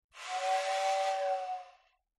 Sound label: Toy Electric Train